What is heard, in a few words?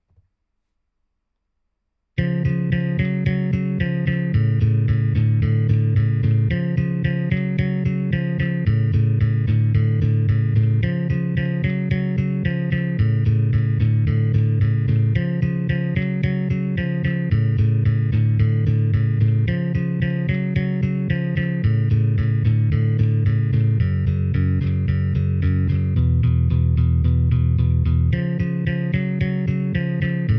AT4047 Tone